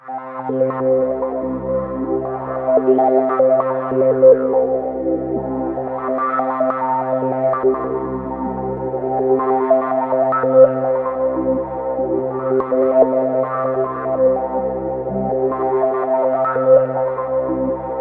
C2, Roland JV 2080 TECHNO PAD

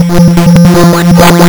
lsdj waves; wavetables

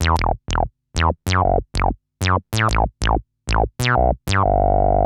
303 95 E, bass; musicradar; bass boutique

